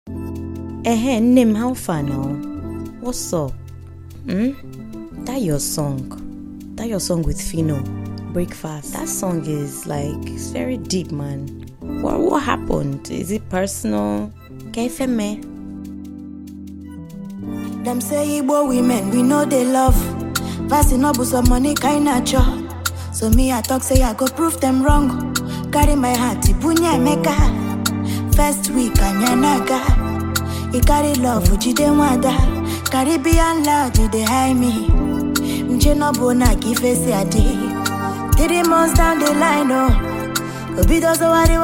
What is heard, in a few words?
Breakfast Acoustic Version